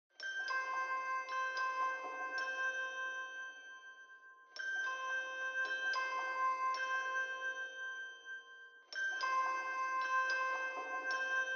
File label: Bell Only